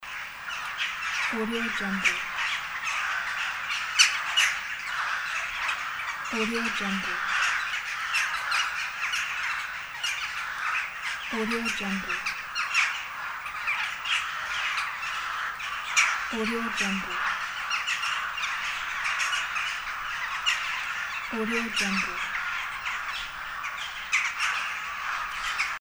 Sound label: birds in park